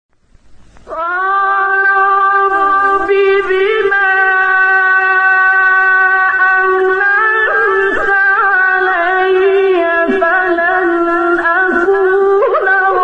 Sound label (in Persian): نوای زیبای تلاوت قرآن آیه سوره قصص; 17; عبدالباسط